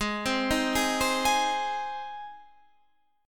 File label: Ab Major, down; Ab arpeggiated